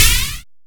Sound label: Snare, dry snares; Quasimidi Rave-o-lution 309